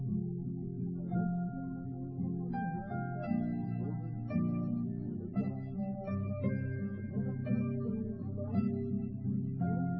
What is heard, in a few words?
nodrums liverec 250ms 5cm sim